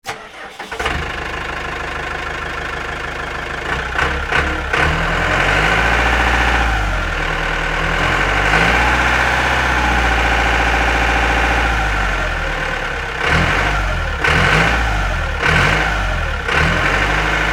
Tow Tractor Run Revs, Industry, Tow, Tractor, Start, Idle, Revs, Off, Diesel, Engine; Pettibone; BlastWave